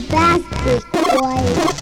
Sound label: Baby